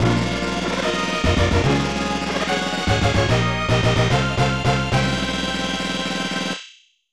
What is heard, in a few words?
Victory!; SD Great Battle 3; SNES